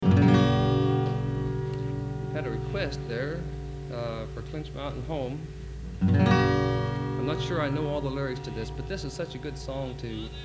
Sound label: Applause